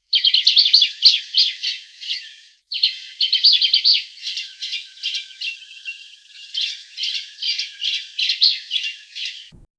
distant bird calls sparse; flutter

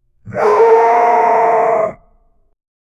animal rage roar; beast

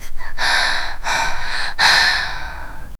girl a sigh; female

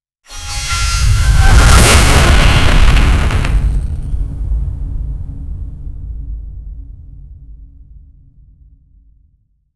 energy

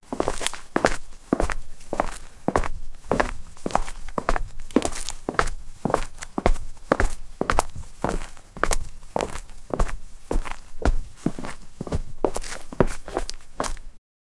foot steps without wear s, quiet

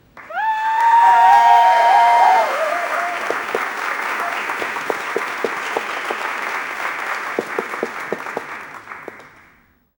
applause congratulations

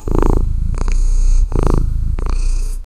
cat purring; domestic animal; domestic animals; grampy cat

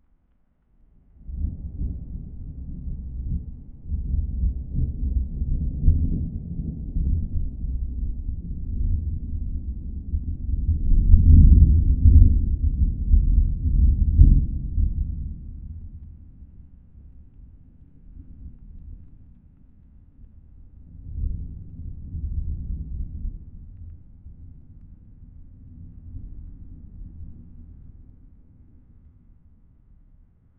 faraway thunder with isol

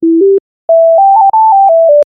level up player rpg

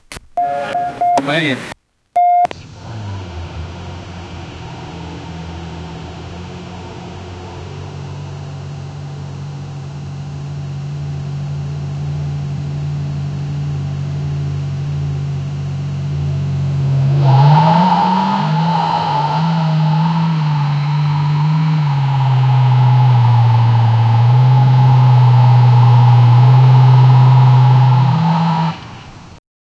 a powerful engine stands, automobiles

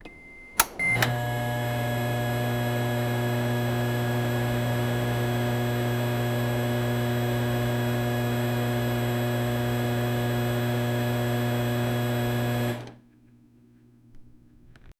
generator powering on

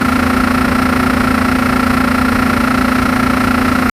airplane piston engine

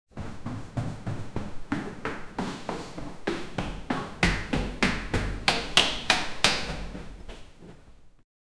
climbing the stairs, ascending

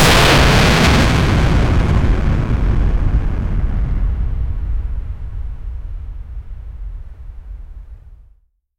rocket launch

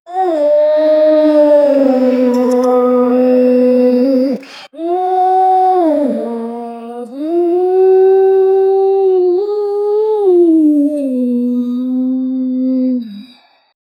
a whale chant that says

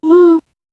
a soft gentle oops sound, education

